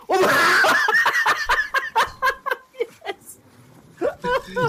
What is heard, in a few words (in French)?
rire